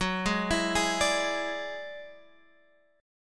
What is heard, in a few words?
F sharp 7th Suspended 2nd Sharp 5th, Guitar, Open G